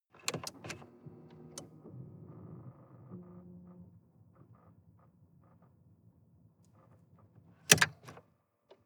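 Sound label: sports car interior ignition on off; verkehr transport; autos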